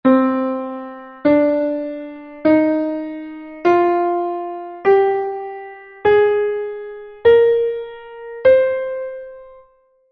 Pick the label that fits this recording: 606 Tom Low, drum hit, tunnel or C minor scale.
C minor scale